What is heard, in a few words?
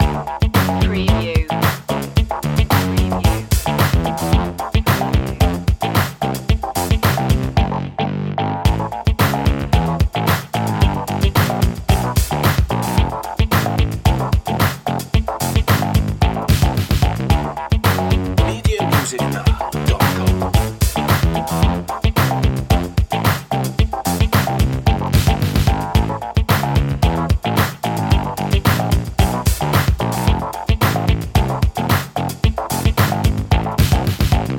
cute n fun loop